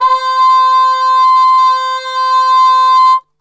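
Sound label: C 3 trumpet cup mute; Best Service; Brass Super Section; TRP CUP MUTE; 90 s Sample CDs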